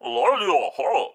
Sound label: Training Start